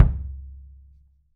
Rubb kick; 3D Drums

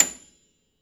Classical Piano ff, A6; Total Piano; Brilliand Classical Piano; Classical Piano ff -ped

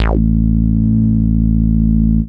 moog, bass; Ueberschall; Techno Trance Essentials

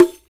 Rek Dom; Roland BK-5; Percussion

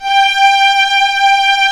STR, Vlas Sect, Agitato; STR Vlas Sect1-2; STR Vas1 Agitato

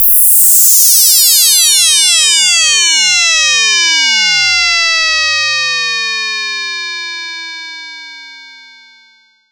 SYNTH FX

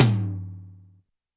Tom Hard, YAMAHA RM1X

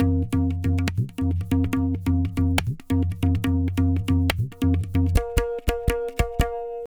Tabla, 140 BPM; Zero-G; Pure Tabla

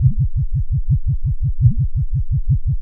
Noise, LFO & Noise

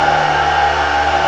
pipe res; Ribulonic Vortex

